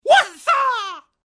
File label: Wansaw voice sound effect karate figth manga; free videogame sound; Sound Graph